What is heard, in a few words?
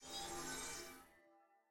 button hover; milio